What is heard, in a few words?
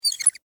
Metal Hinge Squeak, Foley, Metal, Hinge, Squeak; Latch Sound Effects; Latches (Sound Effects:Objects)